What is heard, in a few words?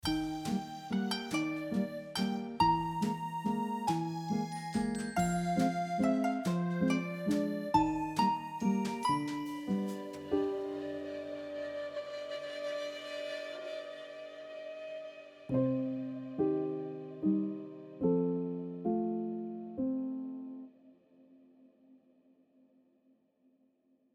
Cute and mysterious